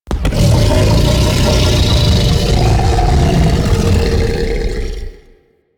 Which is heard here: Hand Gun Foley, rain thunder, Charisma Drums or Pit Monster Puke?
Pit Monster Puke